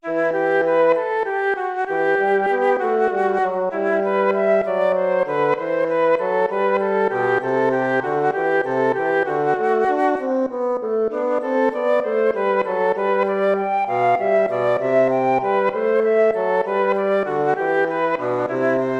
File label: gigue